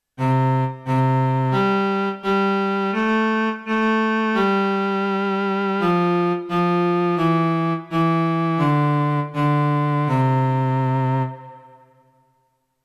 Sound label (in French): violoncelle